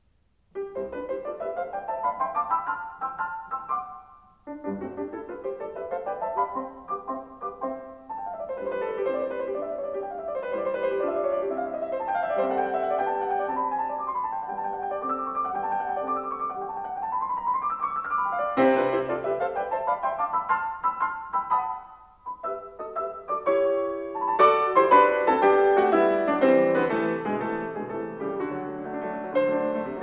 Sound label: Piano Sonata No. 3 in C major; Wilhelm Kempff; Beethoven; Piano Sonatas